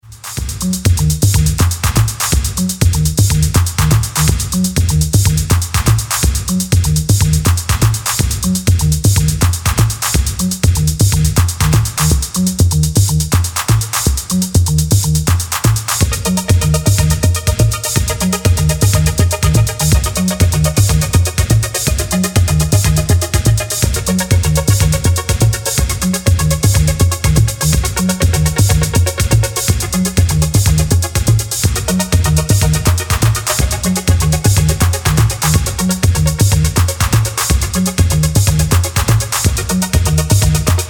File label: Original Instrumental